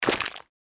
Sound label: giant rat jump; npc